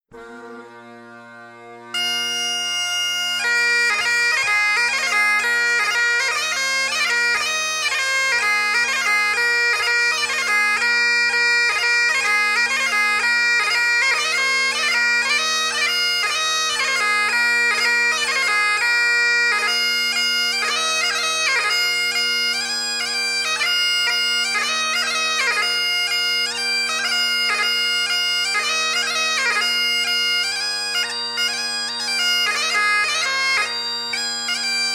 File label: Ewe With The Crooked Horn, bagpiper; Strathspey